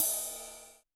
Ride Edge

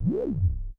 Synth SE

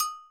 Agogo

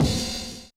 BD Jungle Crash

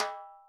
Timbales Hi Rim

